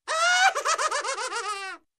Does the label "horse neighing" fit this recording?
No